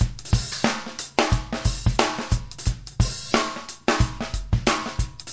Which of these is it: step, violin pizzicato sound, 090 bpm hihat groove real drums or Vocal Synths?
090 bpm hihat groove real drums